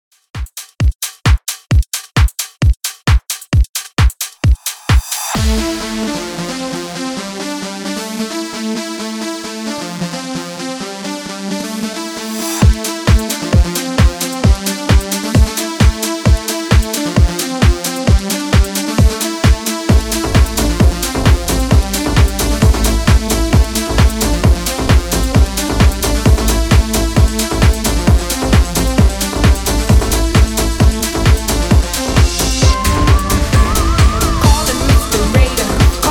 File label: 90s Redrum 132 Bpm